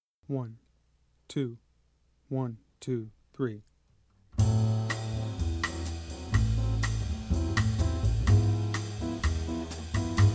Bossa at Night (rhythm only); jazz; easy jazz conception

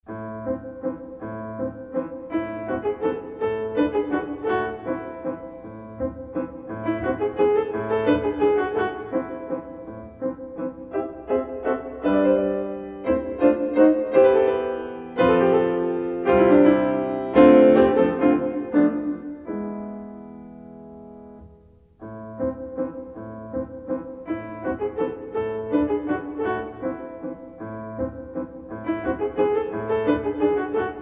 Waltz in a minor; Marlais Book 5

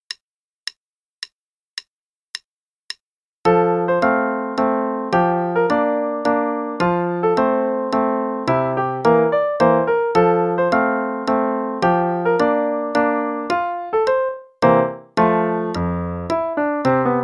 Mazurka in F Major (qn=108); Faber; Play-along: Level 4, 2nd Ed

